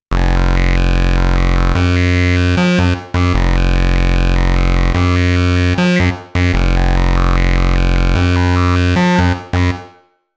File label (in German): Korg KROSS 2 Eigene Samples mit FX